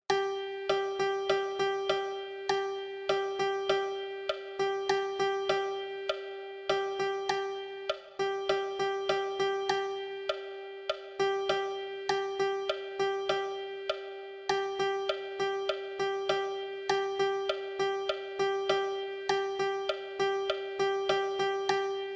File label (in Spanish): percusion